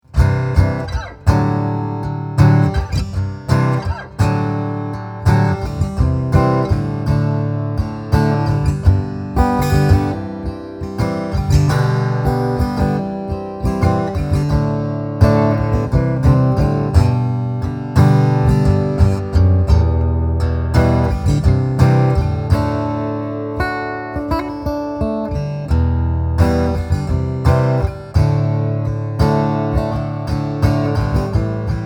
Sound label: cole clark angel 3 strumming